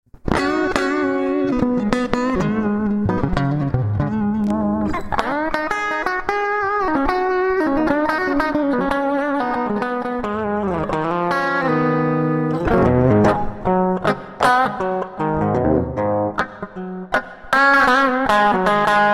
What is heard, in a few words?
Guitar